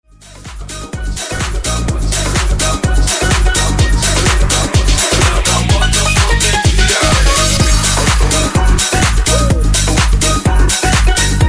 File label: Transition In Moombahton 126 87Bpm